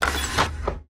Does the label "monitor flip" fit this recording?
Yes